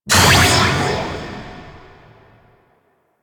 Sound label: Attraction Blast; Warframe; Pets; Djinn